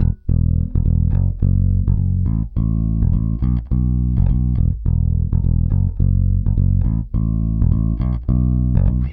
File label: Pop, Bass